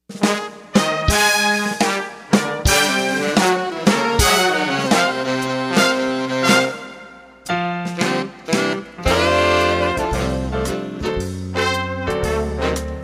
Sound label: Rave Swing Band